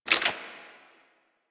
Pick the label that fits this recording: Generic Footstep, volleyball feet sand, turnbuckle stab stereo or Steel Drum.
Generic Footstep